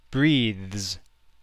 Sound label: breathes